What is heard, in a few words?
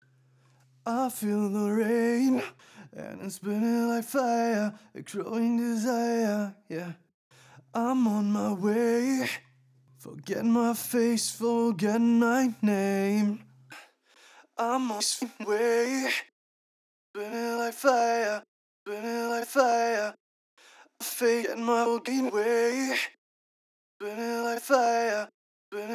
vocals